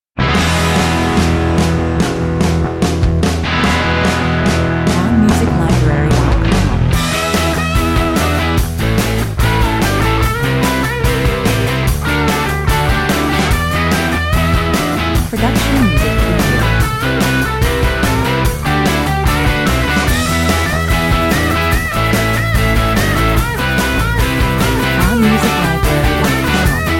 Treibender Indie Rock'n'Roll mit kräftigem Beat, quirligen Gitarren und jugendlicher Lässigkeit, 146 bpm; Passend zu moderner Werbung, Sport und Freizeit.

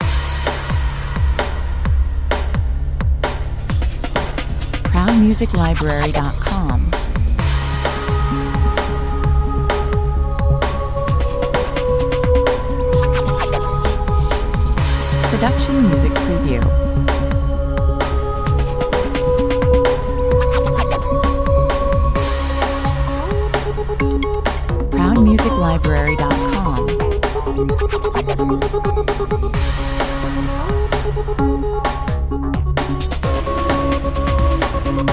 Action und Dynamik, 130 bpm

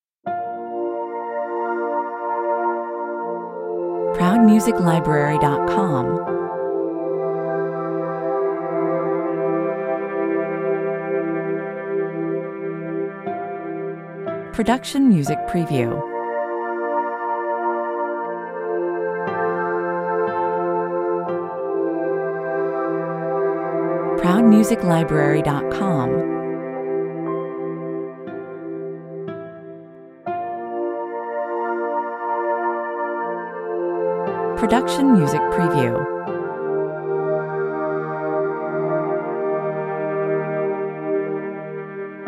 Gitarre, Cello, Piano, Pads, Traum-Musik, warm und weich, entspannt, Meditation, 60 bpm

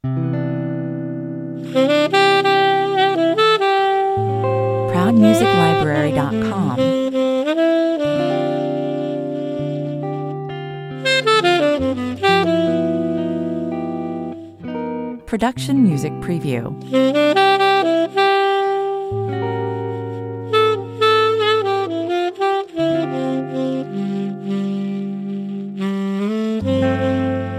Kurze Jazz-Sequenz, sehr smoothy, 107 bpm

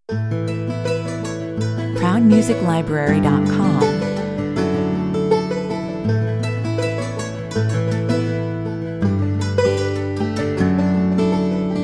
country island, Feines Intro mit Country-Flair, nur akustische Gitarren, gezupft und sanft angeschlagen

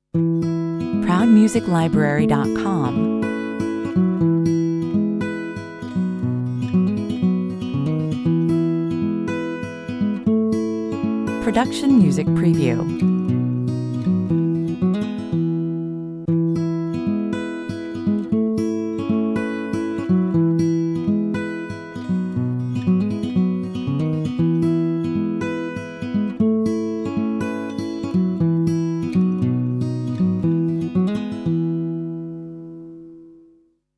sweet just guitar 148 bpm, Sehnsuchtsvolle Gitarrensequenz, kurz mit einem richtigen Abschluß

